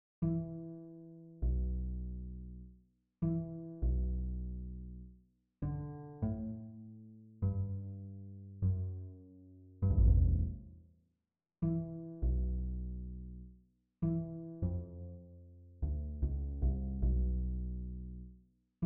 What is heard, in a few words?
String Bass Solo